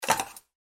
casette

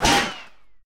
bullet, ricochet; Weapons

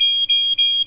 key fail

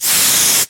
air ride

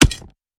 pickaxe hit

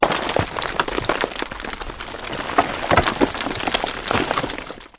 ore pour

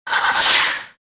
old turbo, Vehicles

